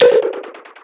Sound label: acorn shot slow; weapons; dota; hoodwink